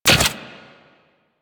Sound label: Morph Cycle; Warframe; Warframes; Khora